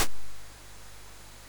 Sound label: sid MOS6581R2, GAMES; Face Off